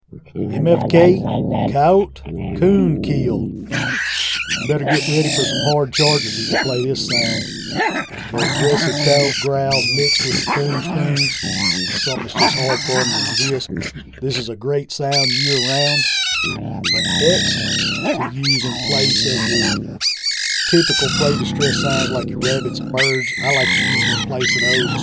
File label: Coyote Coon Kill